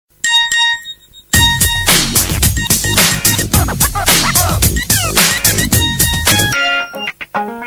Short and simple sms

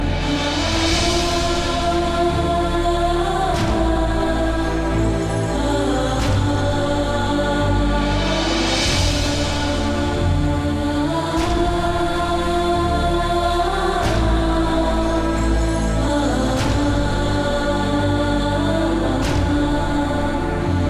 Romantic Tone

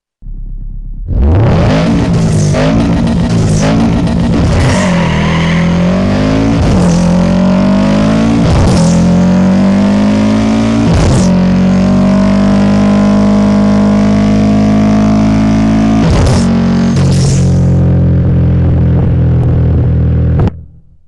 Subaru Impreza

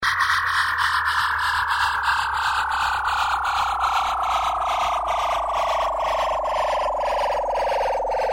Sms Tone

